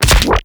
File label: Weapon Punch Shotgun; Warframe; Weapons; Shotguns